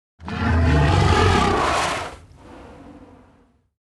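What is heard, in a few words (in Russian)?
Рык динозавра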